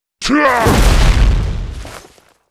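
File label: monster attack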